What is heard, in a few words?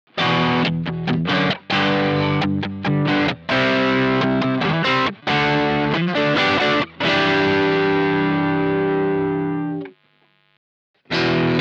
Strat Overdrive Rhythm